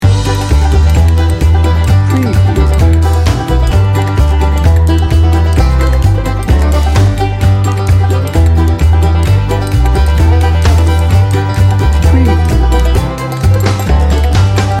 bluegrass blowout loop

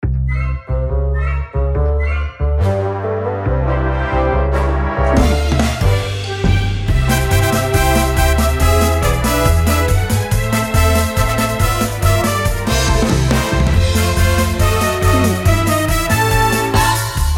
broadway

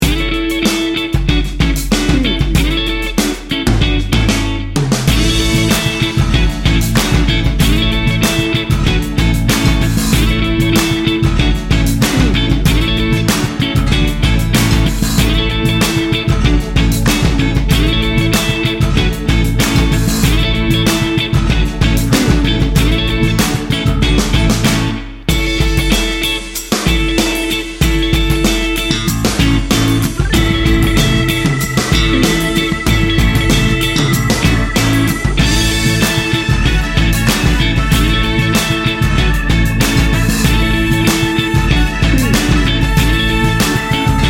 memphis soul

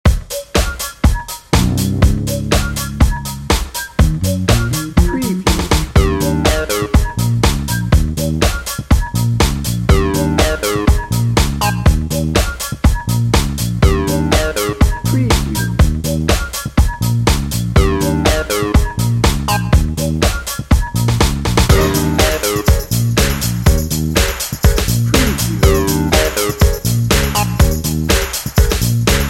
party starter bed

